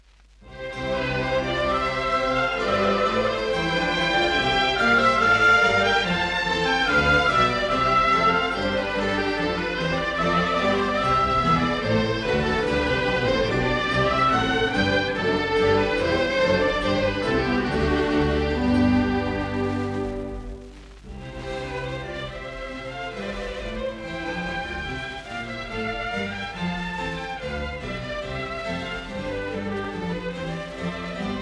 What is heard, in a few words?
Suite in C major - Courante; 1st Suite